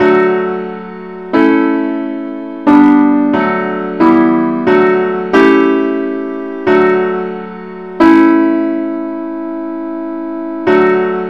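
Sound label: simple Accompaniment key d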